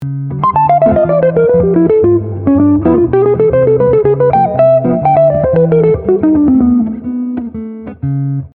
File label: Lick